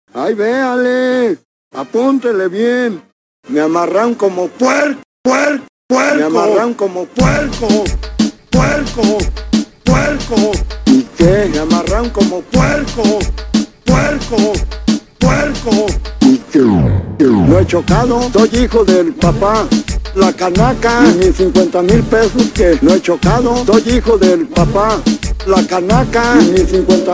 Borracho Reggaeton

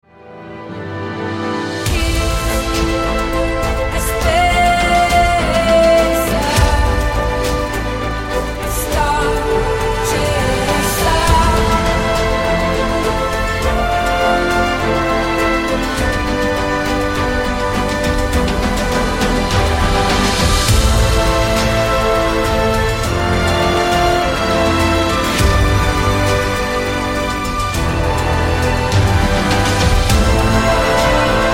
Orchestral Rework

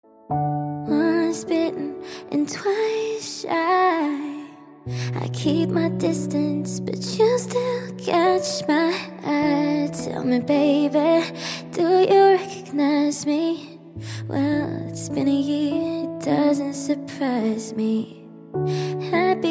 Acoustic

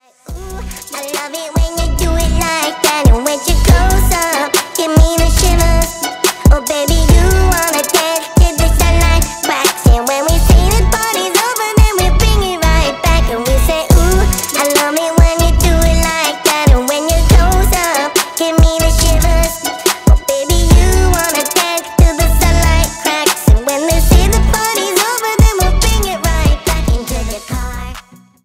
Funny Remix

